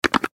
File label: Button Switch